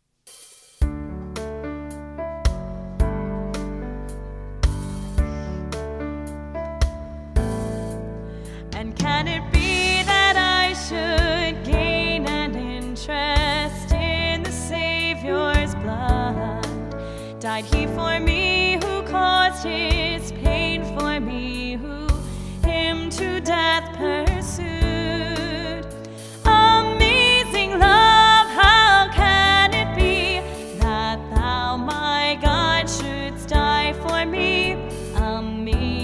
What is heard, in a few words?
Vocal, hymns; And Can It Be